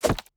environment object grab bait

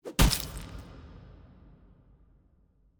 ambience room torture gulliotine cut

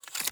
battle preparation exit

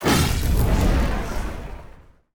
Box Fire Launch; TANK; 3Souls

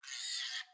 ambience room butchery pigs scream

